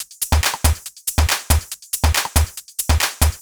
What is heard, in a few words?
Loop 140 BPM; vengeance essential club sounds-3